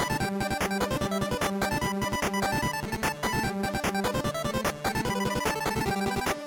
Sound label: ice cream 8 bit; Blind Drive